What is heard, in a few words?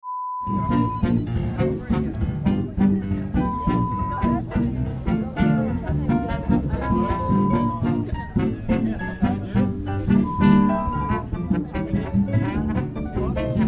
Charlston sound